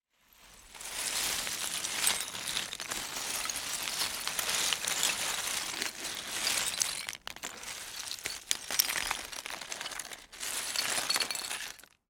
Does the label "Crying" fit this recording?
No